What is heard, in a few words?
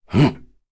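Vox Jump, korean; voices; heroes; Kain; kingsraid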